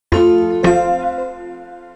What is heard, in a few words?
startup; pyportal